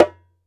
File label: ASHIKO, African Drum; E-MU Producer Series Vol. 7 – Old World Instruments